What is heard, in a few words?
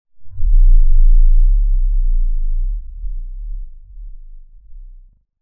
Sideways Town Giant Floor Panel Arrive Rotate Lock LFE; Boomtown; Titanfall 2